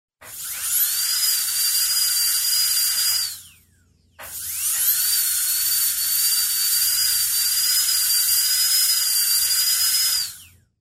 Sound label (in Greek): Epaggelmata Odondiatros